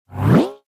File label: reverse motor slide transition